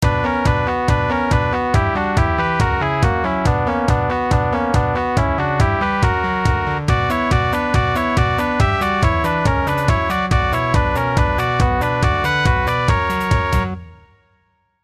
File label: ringtone baroko